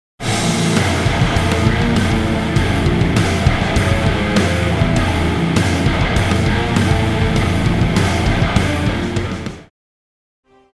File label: combat music; Battle Themes